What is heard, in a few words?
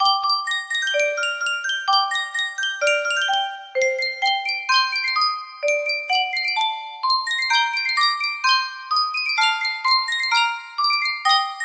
music box; yunsheng custom tune